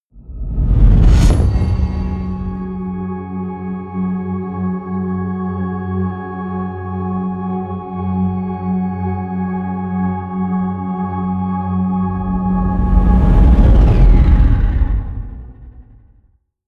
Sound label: promo pad